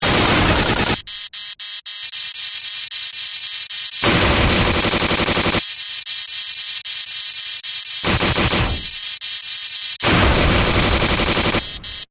Glitch Sequence, Scifi, Glitch, Sequence, Electronic, Failure; Science, Fiction; Robot Sound Effects